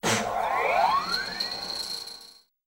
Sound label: Fast Whine Up Cartoon, servos